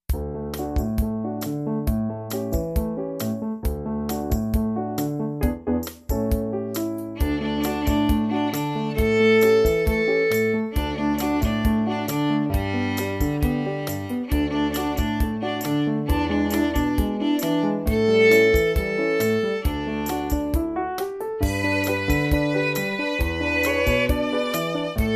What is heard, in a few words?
Fiddle fiesta